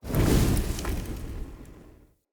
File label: Torch Puzzle Fire Ignite